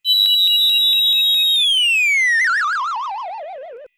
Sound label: enemy petal blast; SNES; SMRPG